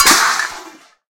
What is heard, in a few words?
pot Hit; hellskitchen